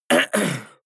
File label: harrumph male mouth open; soundpool